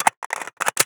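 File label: colt reload; ragemap2023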